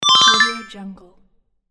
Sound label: sparkly scoring chime; monochromeeffect